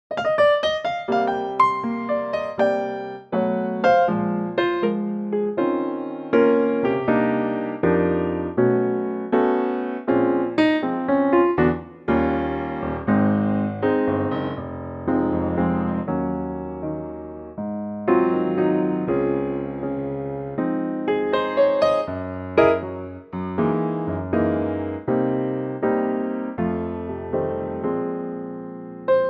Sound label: Ab piano; key - Ab - vocal range - G to Ab; Classy piano only arrangement of this great standard